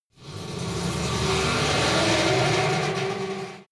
elevator old squeak move start short

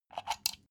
socket pull plug hard

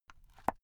watch case small close soft

